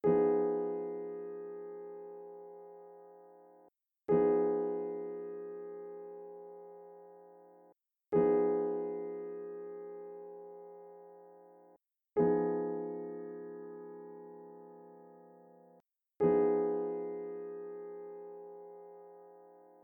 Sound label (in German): tristanakkord isoliert